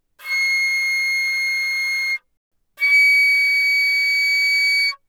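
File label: Flute non vibrato fortissimo C7 Db7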